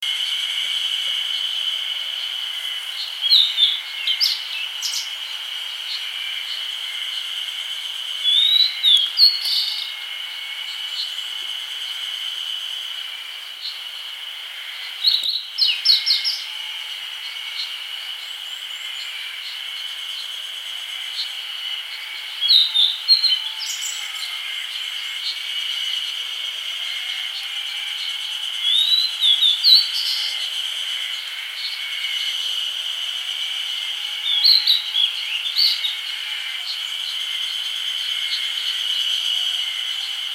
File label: Emberiza variabilis